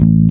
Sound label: bass 4 (A); Sonic Foundry (Sony Creative Software); Ugly Remnants VOL-2; Basstuff